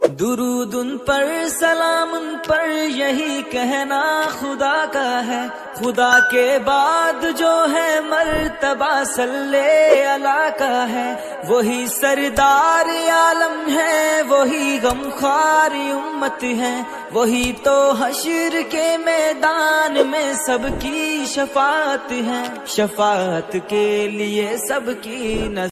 Naat Ringtone

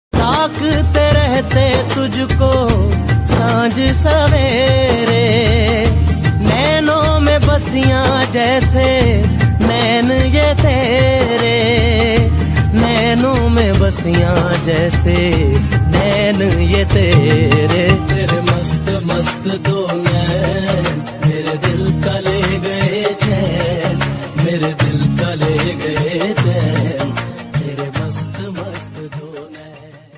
Hindi Ringtone

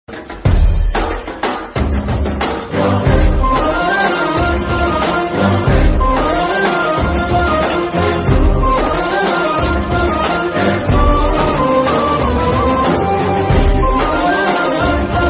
Bgm Ringtone; ringtones

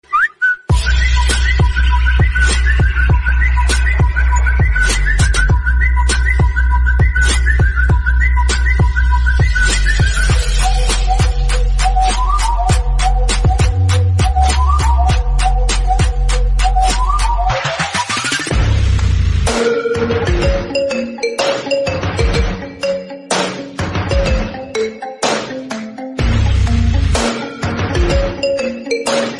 Android Phone Remix Ringtone; ringtones

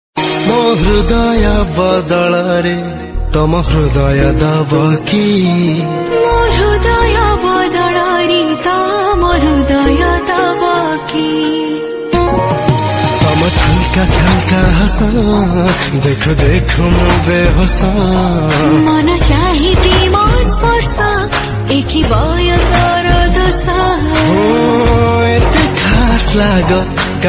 Odia Ringtone

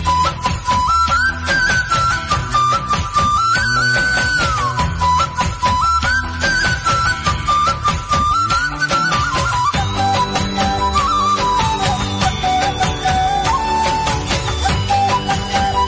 Malayalam Flute Ringtone

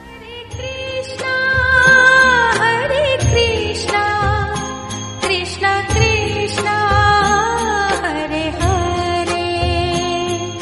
Hare Krishna Hare Krishna Ringtone; ringtones

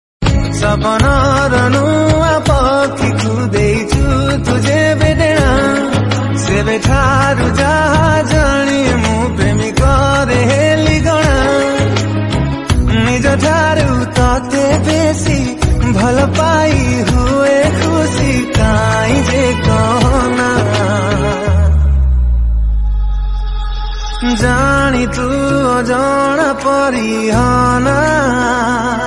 Odia Song Ringtone

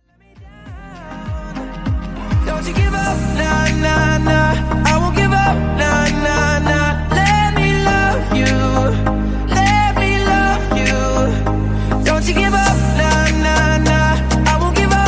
iPhone X Ringtone; ringtones

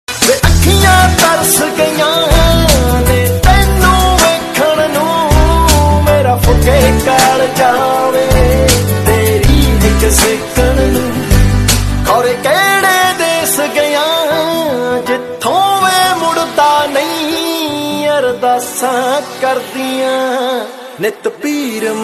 Sad Punjabi Song Ringtone

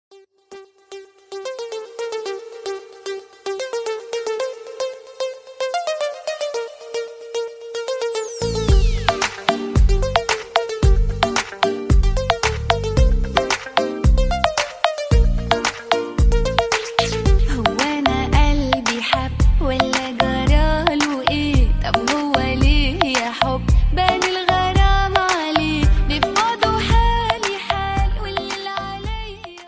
New Arabic Song Ringtone

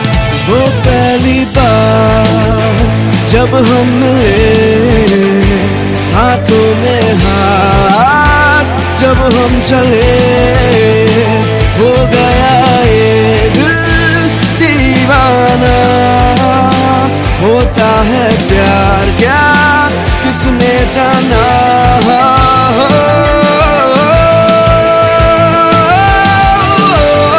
New Love Ringtone

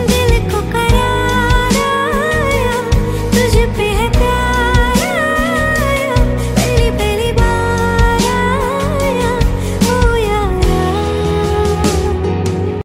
Female Version Ringtone; ringtones

